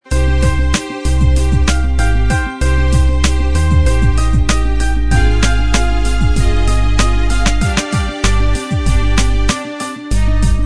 instrumental

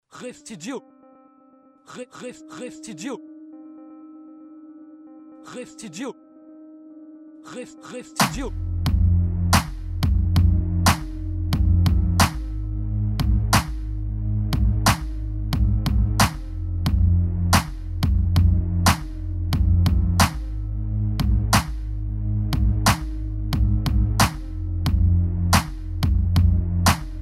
rave beat

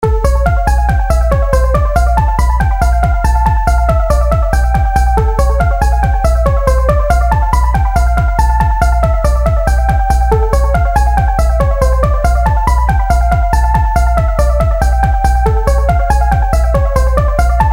trance

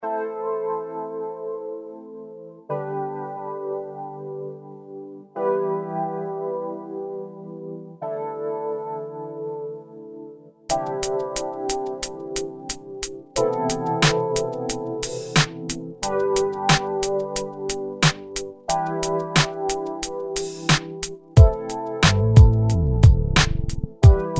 beat instrumental

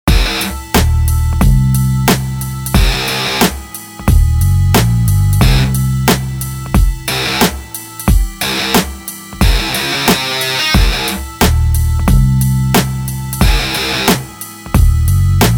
experiment guitar

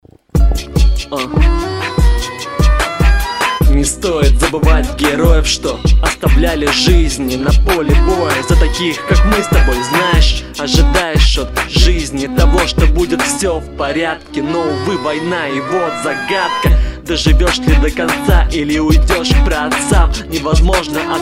rnb battle

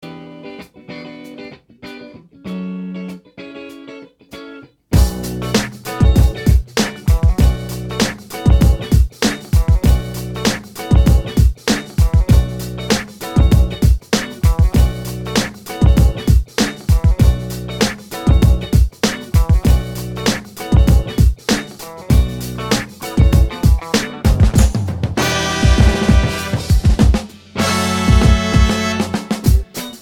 in the car instrumental

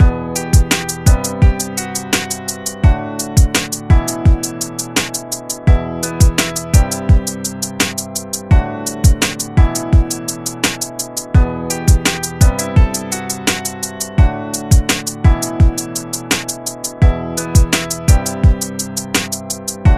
piano

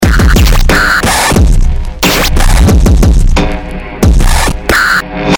beat hardcore

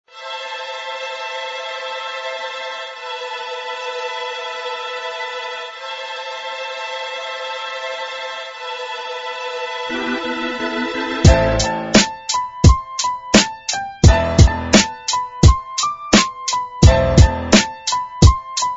instrumental

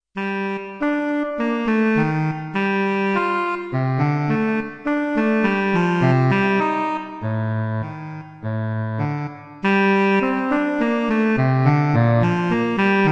bass solo

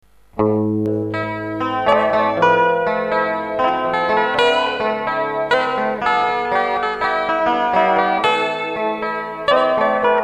guitar clean

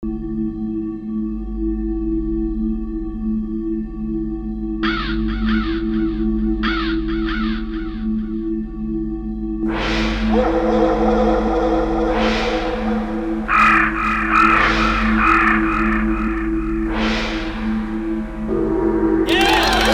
trumpet

